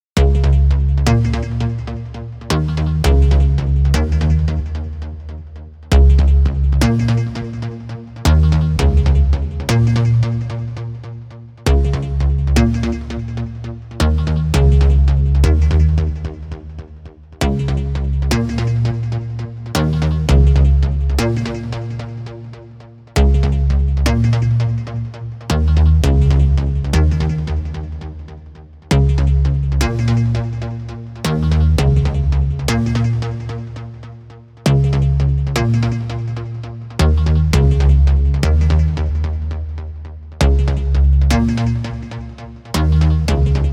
Synth Bass

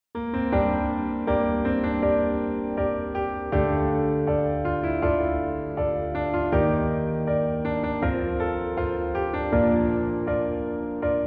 Piano

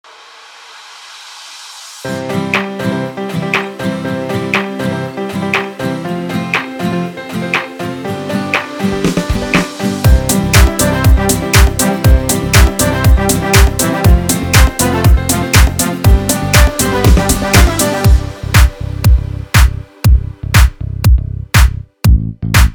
Instrumental

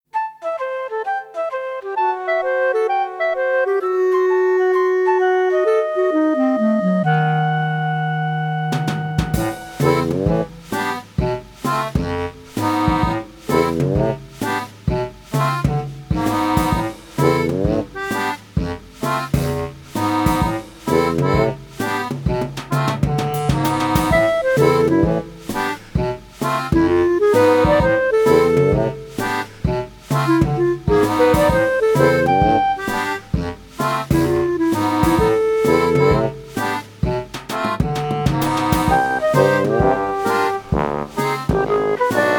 Jazz

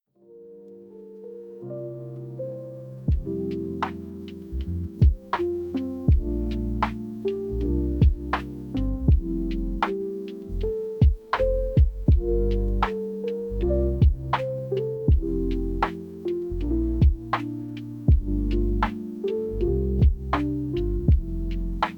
LoFi Jazz

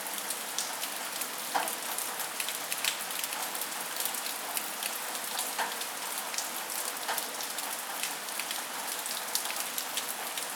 Rain Glass Window Pane

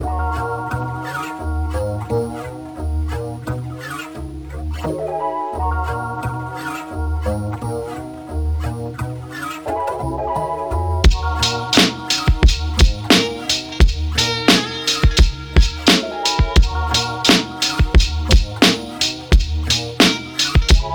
Lofi

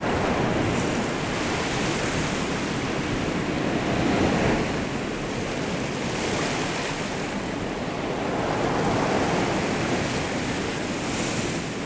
Ocean Storm Sounds; Pacific Ocean

